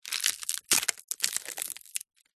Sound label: thick dry pal tree leaf base break crush twist; foley; zapsplat